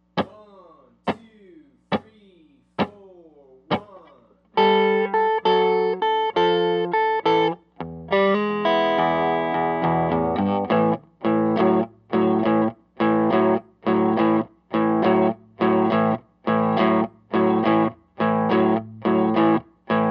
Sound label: 12 Bar Blues in A